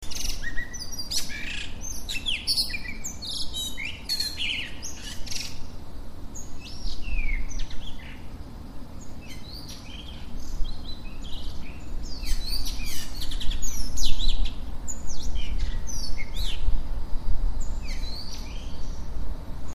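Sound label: blue rock thrush; isohiyodori; kubo jitaku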